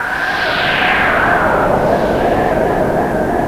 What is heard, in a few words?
Gale, Environments; Sonic Foundry (Sony Creative Software); Myths of Technology